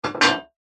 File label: metal shield set down on stone ground; zapsplat